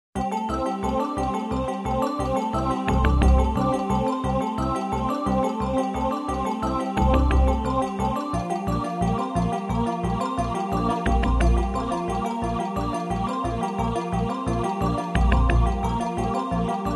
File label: Jingles; 90 DDD Jingles